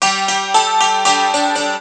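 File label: dulcimer toot, Musical Instruments, europe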